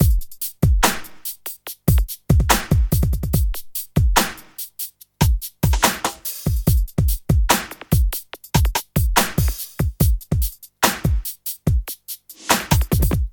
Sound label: Nu RnB; e-LAB